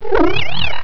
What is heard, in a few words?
he pain; cryocell